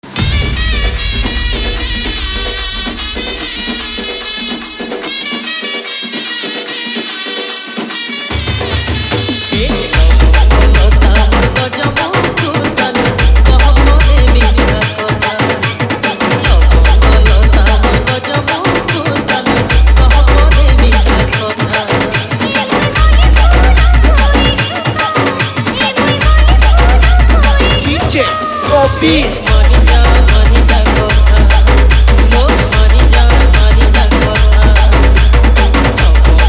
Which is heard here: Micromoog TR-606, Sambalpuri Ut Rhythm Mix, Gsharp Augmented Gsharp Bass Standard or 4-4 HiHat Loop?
Sambalpuri Ut Rhythm Mix